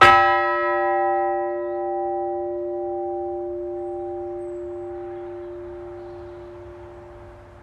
cowfold, bells

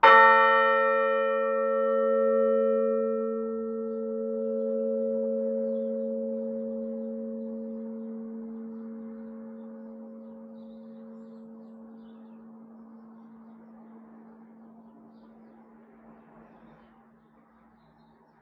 westham five, bells

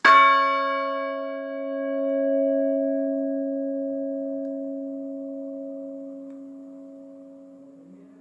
bells of sussex; milland

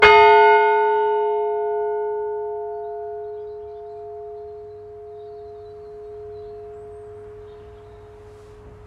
cowfold, bells

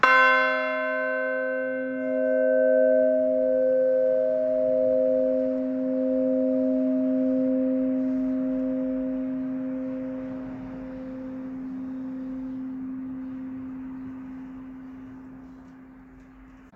five, bells; lower beeding

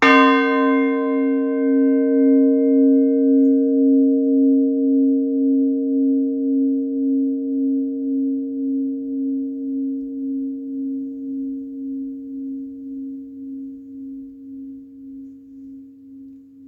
five, bells; stoughton

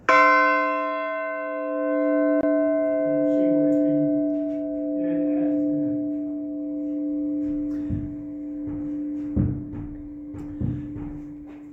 horsham, bells

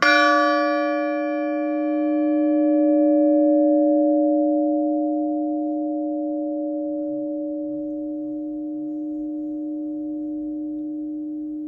bells, funtington four

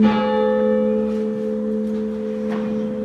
bedding ham third, bells

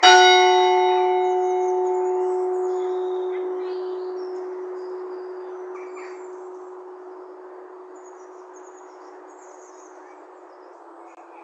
copthorne two, bells

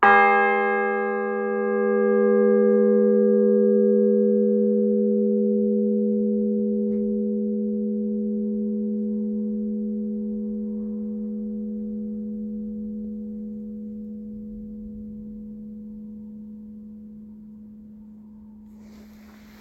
bells, turners seven

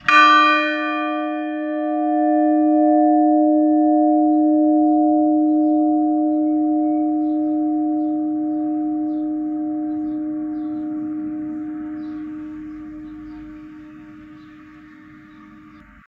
bells, lower beeding old four

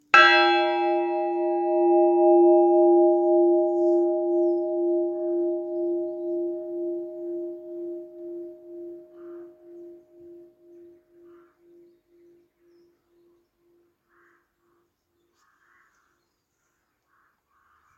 bells, east dean treble

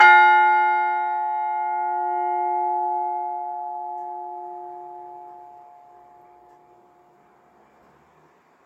3rd, bells; balcombe

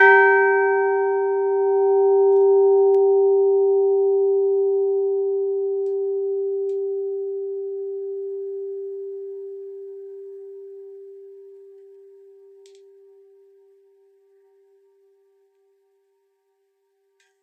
old second, bells; brighton

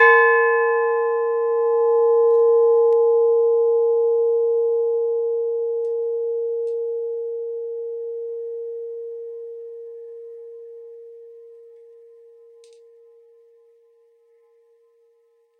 brighton old treble, bells of sussex

